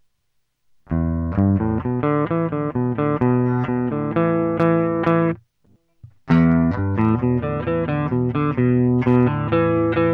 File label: chorus